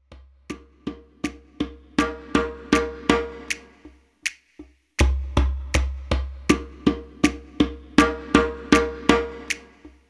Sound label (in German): Warm up 4er slow